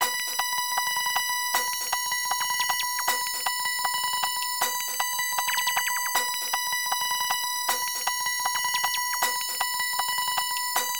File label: Boss BGM; Eco Fighters